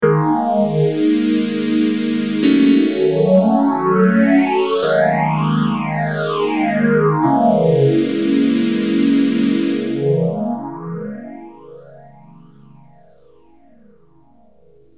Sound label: phase filter